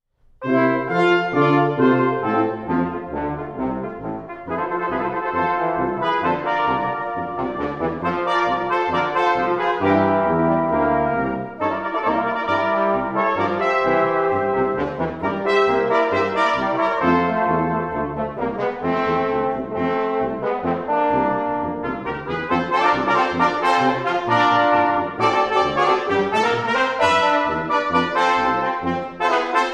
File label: Polka